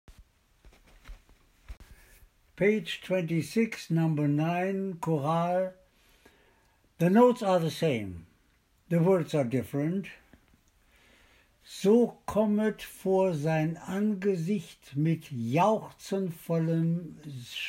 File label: BWV 117, Tenor